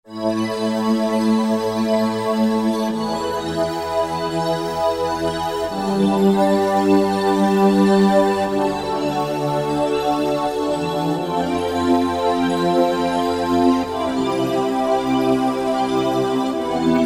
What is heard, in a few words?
Initiation, Roland V-Synth